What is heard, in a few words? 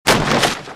Crate Smash; destructibles